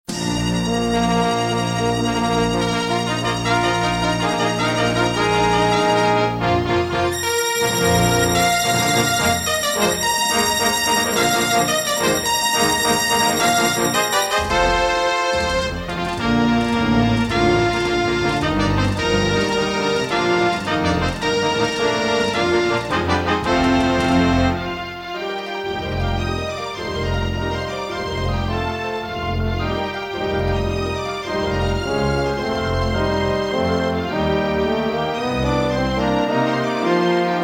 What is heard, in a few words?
Intro, Creative Wave Blaster II; Dark Forces